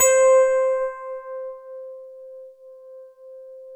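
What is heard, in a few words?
FM electric piano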